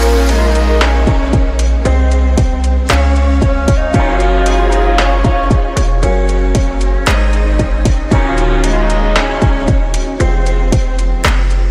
Notification Sound; Huawei P30

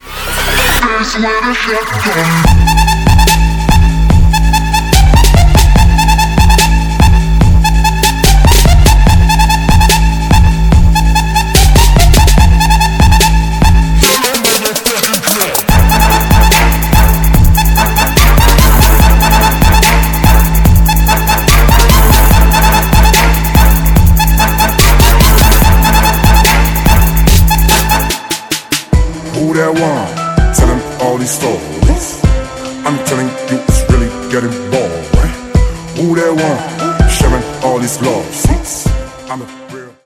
English Ringtone Shotgun Music

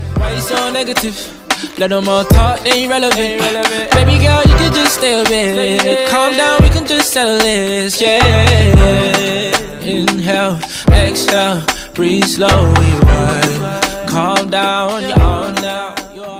Cool English Ringtone

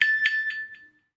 iPhone 12 Keys Ringtone; ios 14

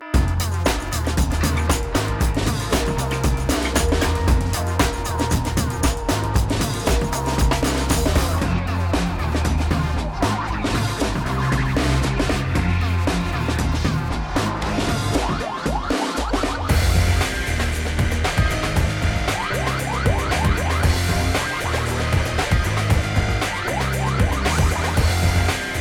Orchestral Ringtone; Huawei Tune

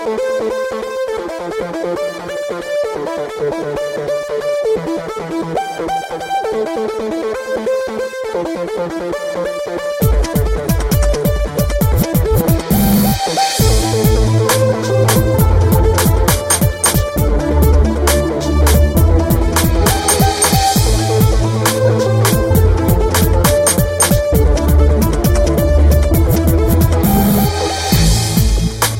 Instrumental Ringtone

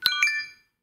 iPhone 12 Complete Ringtone ios 14

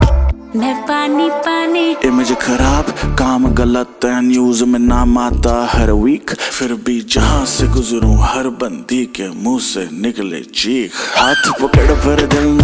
Hindi Ringtones

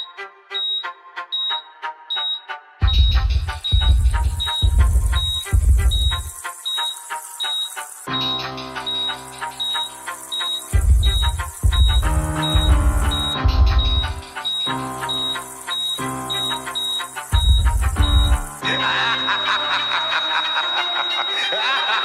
Infinix Zero 4 Plus Ringtone

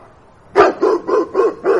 Dog Notification Ringtone

